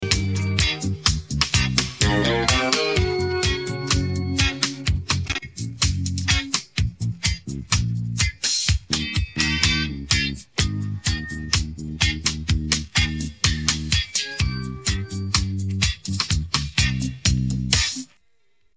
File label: no vocals